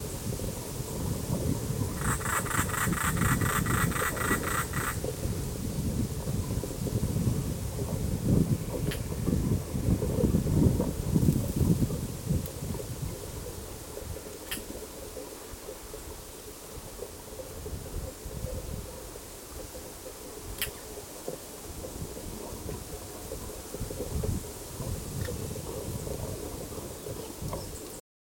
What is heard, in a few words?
European Roller Coracias garrulus, Ladakh; Ladakhi name:Kujuk